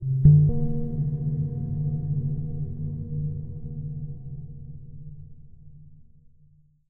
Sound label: editor blips; Source Engine; Portal 2